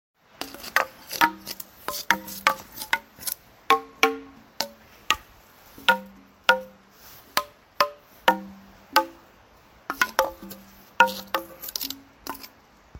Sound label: Wooden Tap